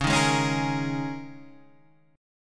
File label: G sharp Suspended 2nd Suspended 4th C sharp, guitar